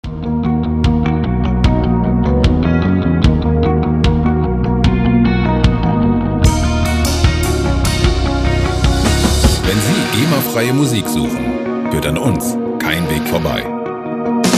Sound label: indie revolution